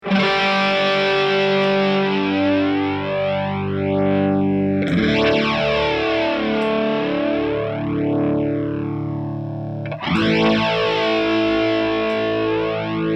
stehende Chords; KoT Paula S

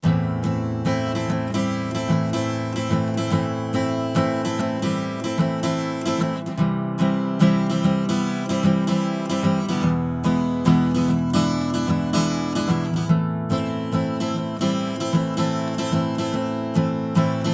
Summit Audio EQP 200B Gitarre mit EQP 200B

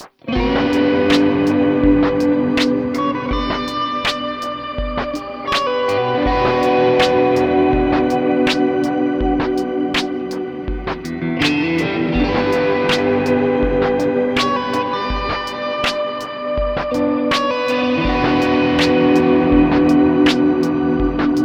Snare Trap mit Kemper Ambient

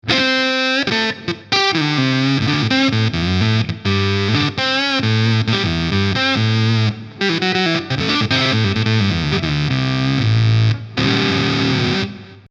full gain oktaver hell switch up steg synthiemäßig; BEETRONIX; whoctahell

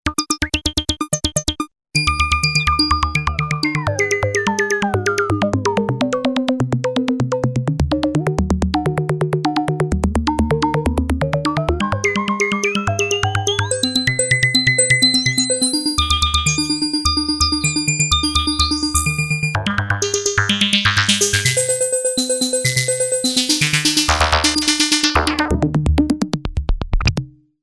Zufalls Arpeggiator; P600 gligli

